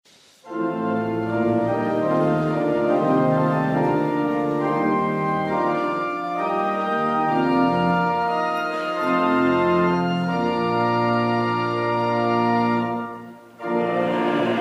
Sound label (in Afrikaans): Gemeentesang